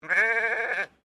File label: Animal Sheep Unknown Baa; Sheep at Collingwood Children's Farm